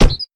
Stab; lethal company

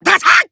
Emo Pain; disney star smash android ios mobile gamerip 2020

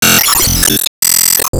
Enemy Iceberg Active One Arm Press

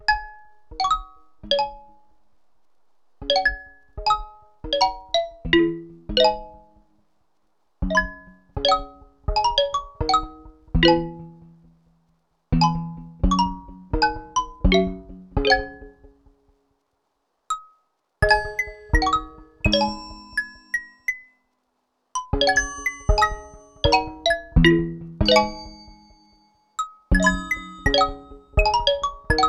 MUSIC BOX; OFF 2025; Switch Windows; DO ANTS SLEEP SOUNDLY TOO

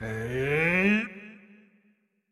WindUp Long; Marv

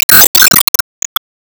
Enemy Tower Destroy

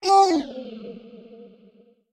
Hurt

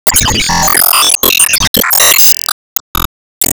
Se Btl Start Transforme

